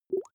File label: small bubbles single soft